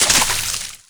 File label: land water; terrains; Tony Hawk's Project 8; XBOX